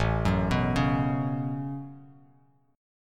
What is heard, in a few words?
A 6th Add 9th A down Bass Standard; A6add9; arpeggiated